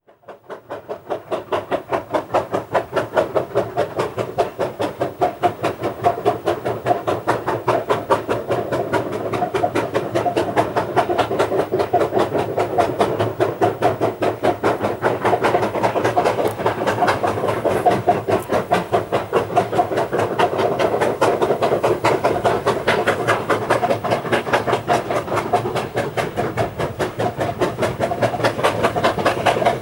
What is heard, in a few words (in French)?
locomotives a vapeur; sonotheque